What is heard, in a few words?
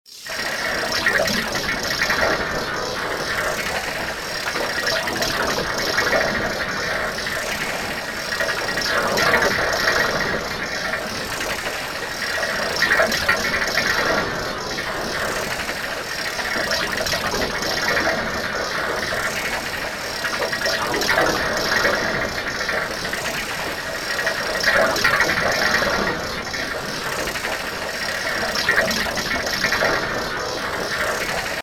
fast roll waterblub